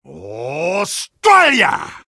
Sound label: sniper taunt head exert; video games; source engine; tf; taunts